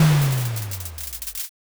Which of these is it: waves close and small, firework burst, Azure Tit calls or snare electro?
firework burst